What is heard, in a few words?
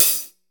LITE HAT; E-MU Formula 4000 Series Vol. 3; Analog Odyssey; VK Instruments